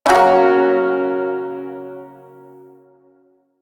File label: Shawzin Yo Chord A, Music; Chords